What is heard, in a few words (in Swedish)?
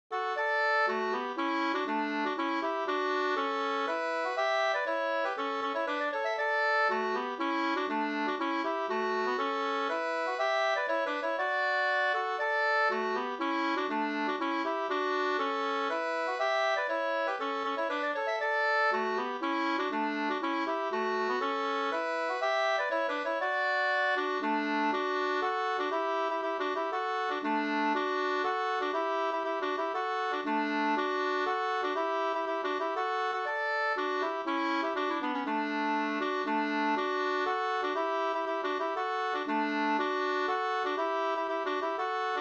hamburska; Ringkarleby Nk